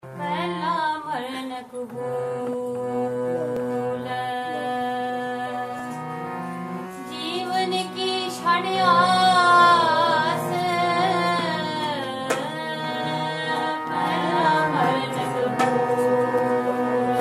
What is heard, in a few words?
Keertan, Rainsbhai; Coventry